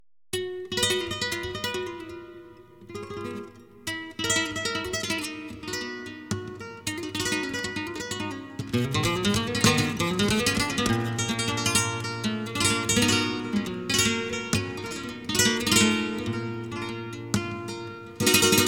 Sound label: Solea